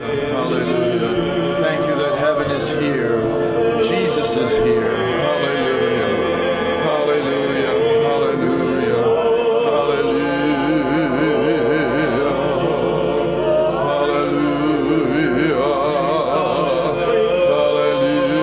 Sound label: Singing and Prayer